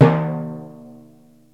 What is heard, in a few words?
TINPANY; Crypton - DrumBase5000